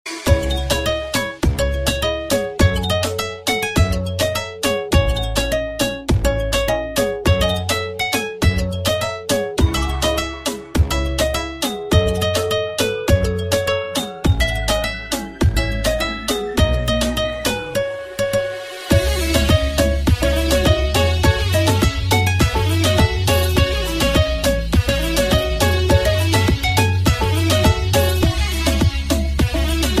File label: INSTRUMENTAL RINGTONE